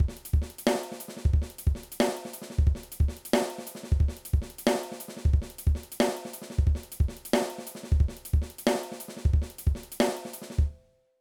Impressive Beat

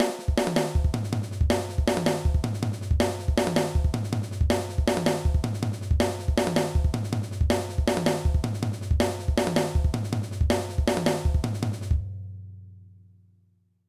Chop

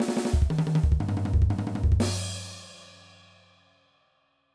Punk Fill, drum

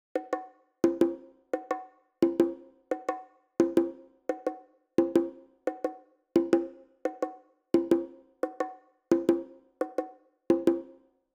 Bongo Pattern

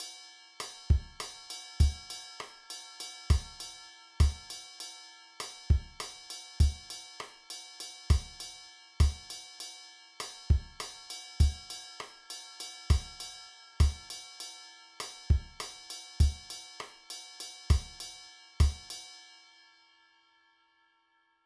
Mambo Beat, drum

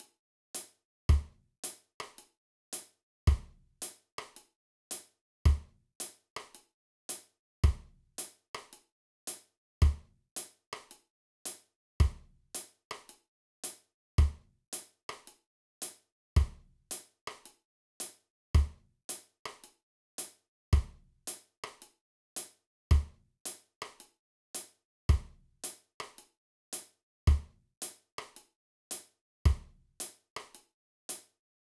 Reggae Beat, drum

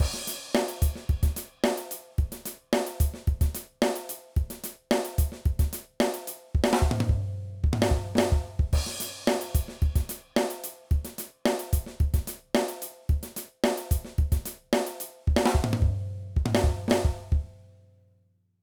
Pocket Fill

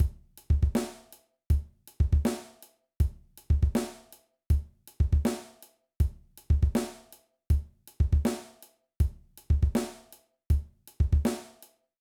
Kick Drum Technique Exercise

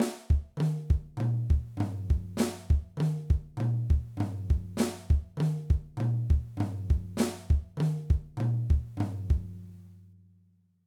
Flam Drum Fill

